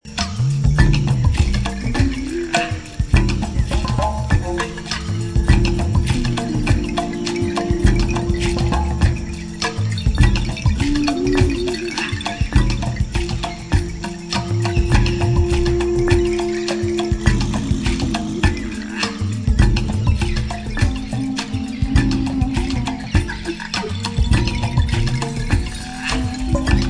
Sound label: jungle strut, jungle drums